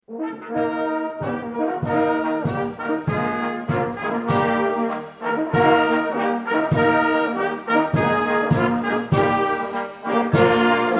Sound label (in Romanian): Fanfara